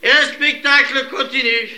alerte sub

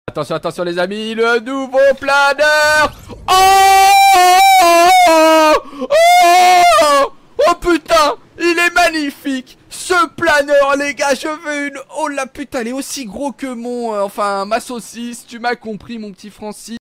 le planeur

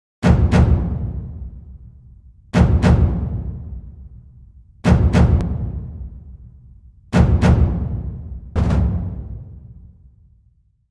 tambour dramatique

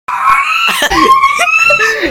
le rat enfermé

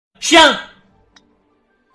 chien

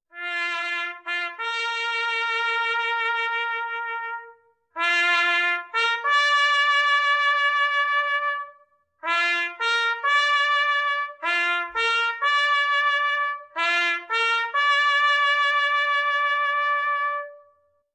clairon funebre

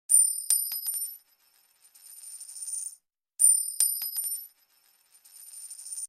bruitage pieces de monnaie

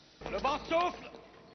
le vent souffle

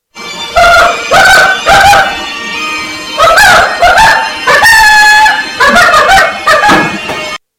le bon coq

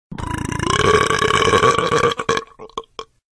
gros rot

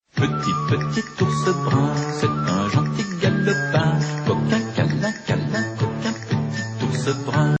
generique; petit ours brun

